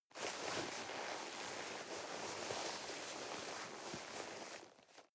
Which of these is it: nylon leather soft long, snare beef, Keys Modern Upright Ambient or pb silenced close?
nylon leather soft long